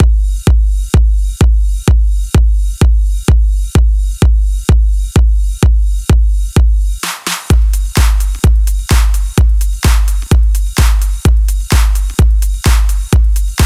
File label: Progressive Beat; Mutekki Media; Vengeance Electroshock Vol.2